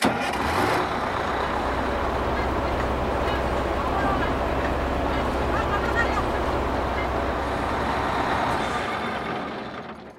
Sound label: bus sound